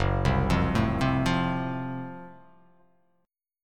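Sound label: Gb Minor Major 7th Flat 5th Gb, 8 string; arpeggiated; 2,3,3,2,0,0,x,x; JGuitar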